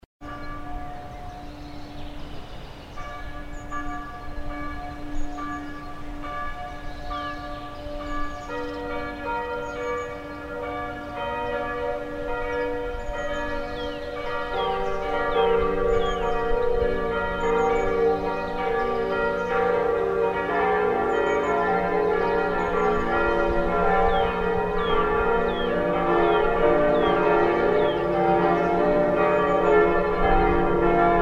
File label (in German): Glocken Langenargen